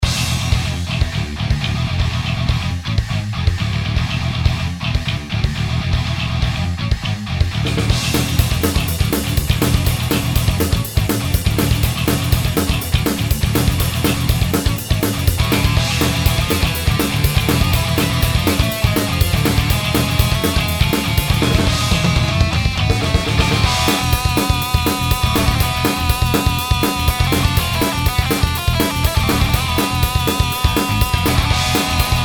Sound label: Metal; かっこいい